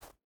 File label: footstep boots snow jump start; Rust